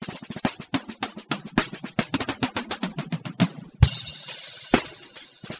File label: Drum Solo